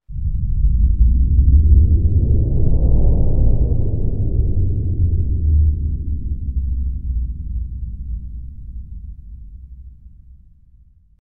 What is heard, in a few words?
Sub woofer - Slow wind rush; Hollywood Edge Sound Designers Tool Kit